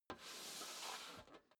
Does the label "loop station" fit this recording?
No